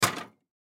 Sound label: Teapot Metal Down, Teapot Metal Set Down Stove Top Antique Tea; Kettle; Dishware; Blastwave